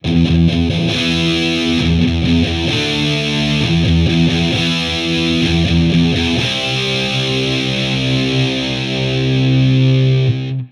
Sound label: layer, amp samples